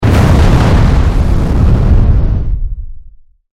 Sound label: Science Fiction Explosion Flame Burst; Battle (Sound Effects:Science Fiction)